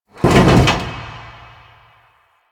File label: Large Vehicle Creak; Warframe; Ambience; Nineteen Ninety Nine